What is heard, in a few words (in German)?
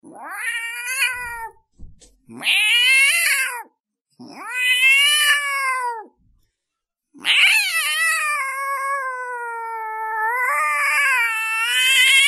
bose katze